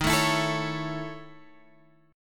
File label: A Major D sharp; strummed